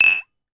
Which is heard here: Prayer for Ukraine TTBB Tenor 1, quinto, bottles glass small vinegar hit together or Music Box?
bottles glass small vinegar hit together